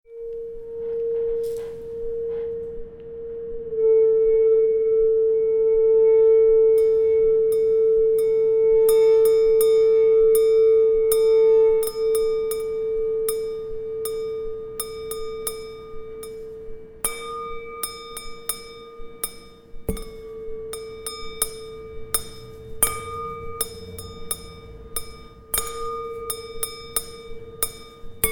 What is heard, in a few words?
wine glasses